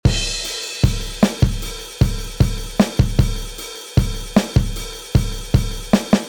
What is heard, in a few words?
droge drums